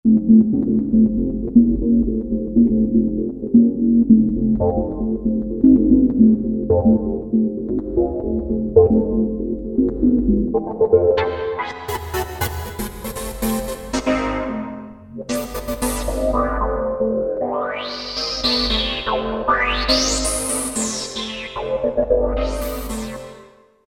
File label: Bass, Novation XioSynth; Novation XioSynth 25; JZab troit Bass